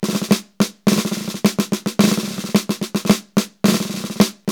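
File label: snare rolls; Brush&Rolls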